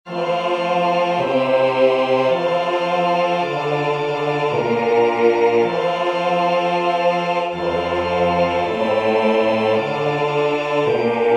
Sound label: Kyrie bass; singing divine service iii in parts